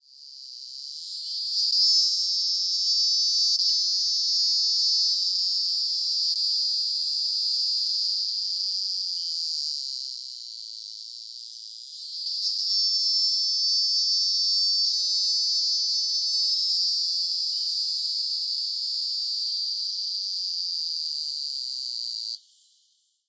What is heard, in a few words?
Neocicada hieroglyphica; US; FL; Neocicada hieroglyphica johannis candidate; cicadas